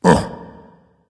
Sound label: ugh dragon; fafnir